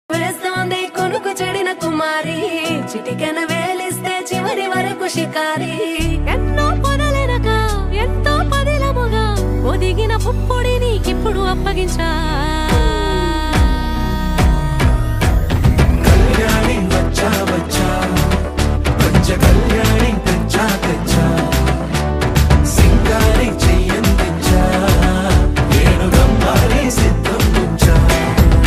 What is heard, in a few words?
Tamil Song; vijay deverakonda; family star